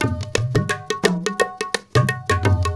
INDIA R, IND ENS PH, Heart of Asia; Spectrasonics - Heart of Asia CD2

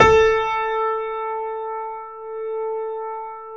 GRANDP ST A 3 F; USB Soundscan vol.17

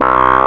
WOBBLY CLAV, clavinet; Zero-G; Funky Elements

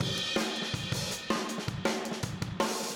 Room, Drums, 162 BPM

